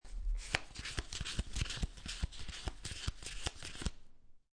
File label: Playing Cards Counting Cards